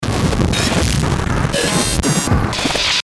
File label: Glitch Rhythmic Distortion, Glitch Electrical Pulse; Production Element Imaging Element Accent Transition; Blastwave